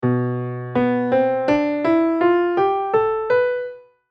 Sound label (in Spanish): modo locrio en piano